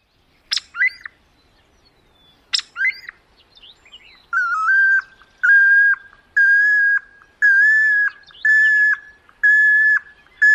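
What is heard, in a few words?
typical calls and whining whistles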